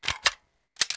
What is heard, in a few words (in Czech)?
zásobník; Mafie 1